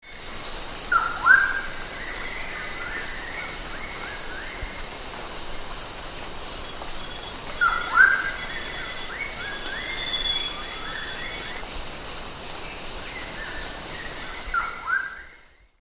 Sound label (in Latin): hydrornis oatesi, ptaki; kurtaczek rudy